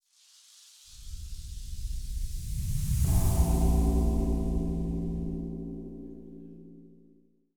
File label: Lighthouse Lantern